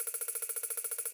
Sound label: count Up Loop; halloweenjack